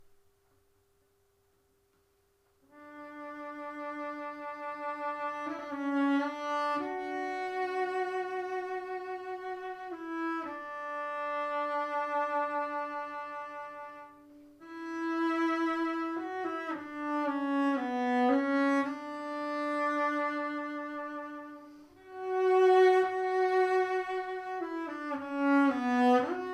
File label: Solo Cello Ave Maria; classical string quartet